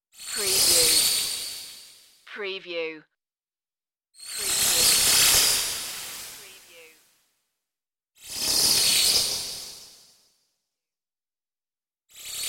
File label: fizzling flyby swooshes